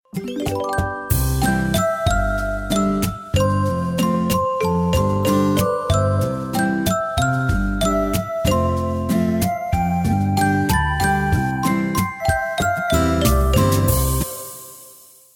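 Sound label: standard melodies gionee stock melody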